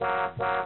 honk honk high; 3dcity

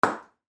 pinball button off

